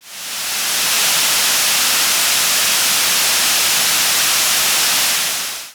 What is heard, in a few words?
White noise